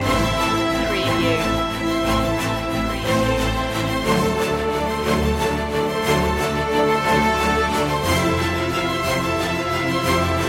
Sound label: no choir no perc